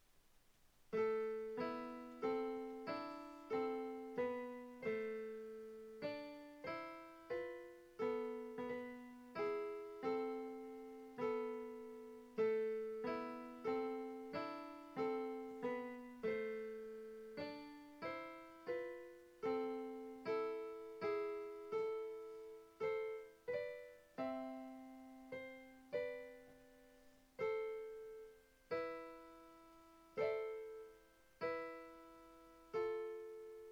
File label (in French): Noël piano